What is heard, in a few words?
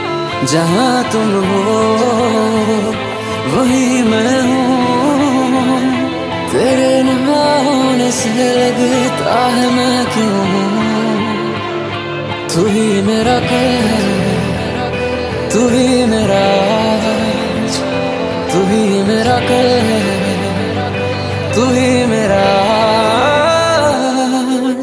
New Hindi Song Ringtone